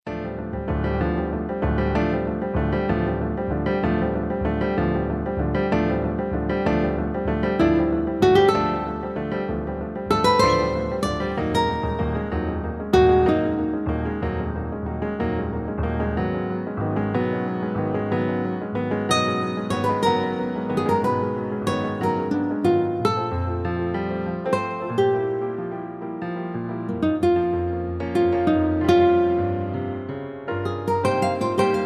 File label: GUITARO ISLAND